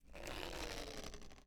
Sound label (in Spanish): Planta creciendo